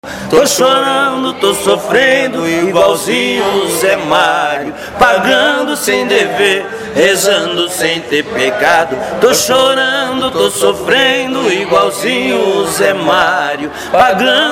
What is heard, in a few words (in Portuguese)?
vinheta cantada curta